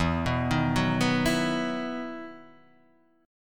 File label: E Major 7th Suspended 2nd; EM7sus2 arpeggiated